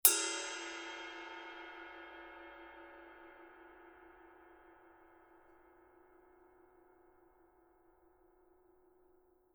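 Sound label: signature 22 dry heavy ride body, cymbales; Forgées à partir d’un alliage secret de bronze; paiste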